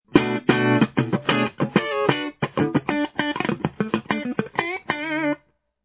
compressor, effects

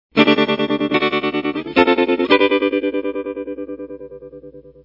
trmolo; strat2; Tremolo

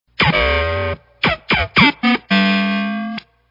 ring envelope

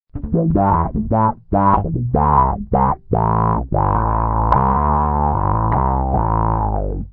bass envelope; bass distortion-envelope

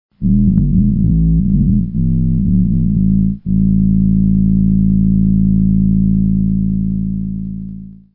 infrabass

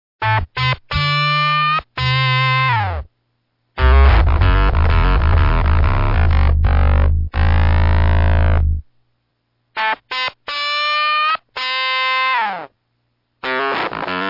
infrabass octave up; octafuzz

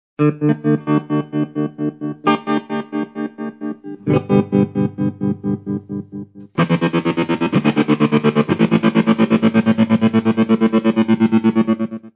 tremolo square; square wave modulation; electronic guitar effect

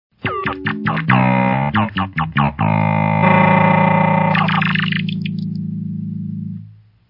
bass ring

